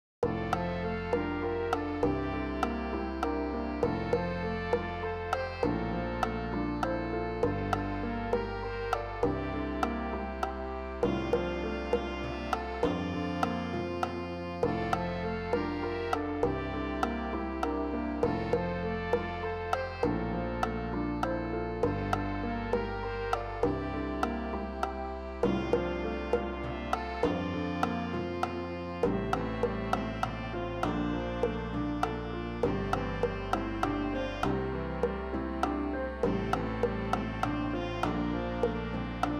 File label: Salsa Band; Ise Oluwa; WLU